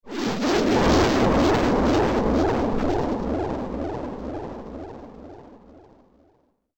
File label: Quirky Alien Discharge; Laser Sound Sound Effects; science fiction sound effect